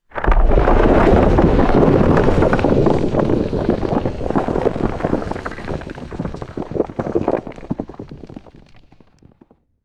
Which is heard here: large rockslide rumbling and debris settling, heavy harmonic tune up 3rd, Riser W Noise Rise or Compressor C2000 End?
large rockslide rumbling and debris settling